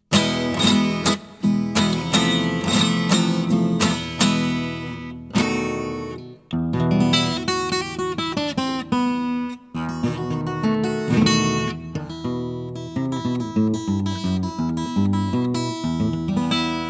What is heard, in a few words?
Improvisation